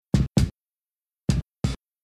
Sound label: Rhythm Breaks Loop Kick